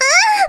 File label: harp, note; LumiCu 2.0; minecraft